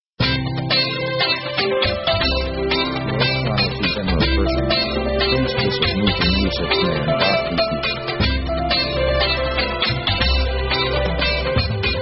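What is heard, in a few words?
pop orchestra upbeat; easy themes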